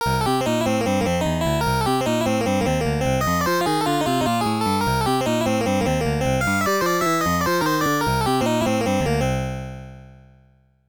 Result Music; Mappy; PC-8801

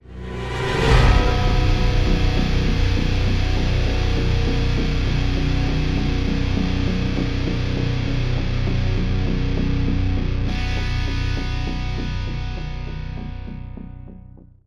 GTR

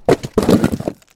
rock

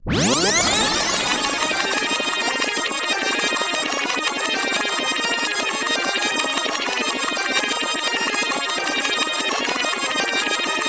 Jingle rew; Blinx 2 Masters of Time and Space; Xbox

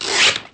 Rip

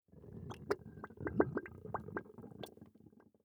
mud fart bubble slow

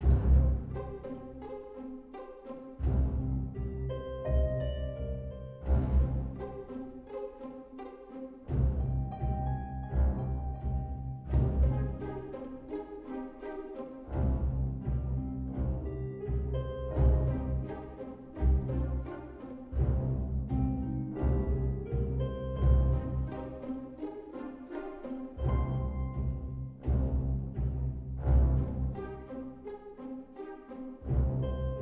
General Exploration Loop; Fort Triumph

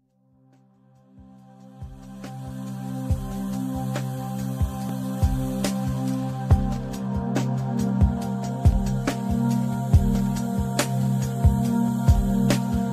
Truck Service; euro truck simulator 2

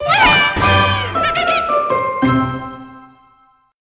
Crunchy Finish

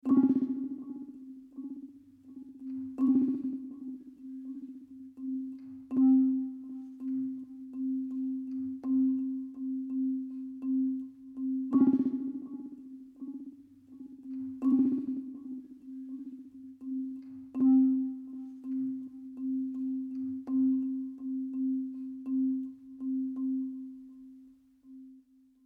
Marimba and Drums Mood; gamerip; the last of us

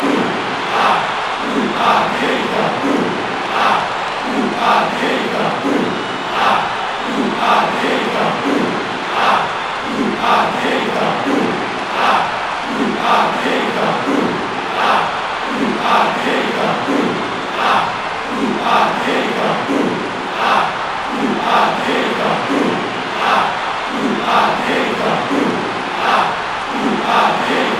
Crowd Song; Diddy's Crowd Song; mario strikers charged gamerip

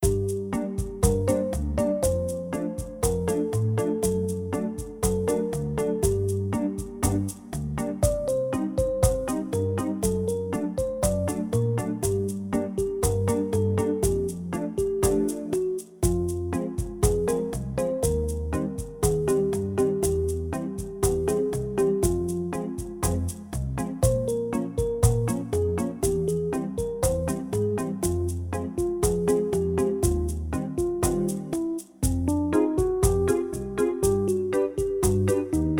cha-cha-cha; Fran Bow

